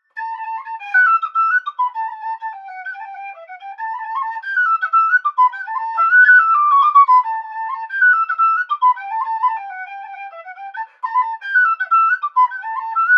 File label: Wind Instrument; Assassin's Creed Syndicate